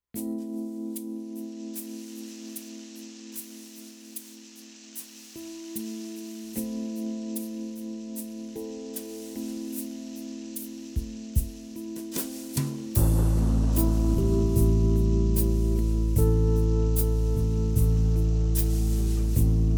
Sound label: rain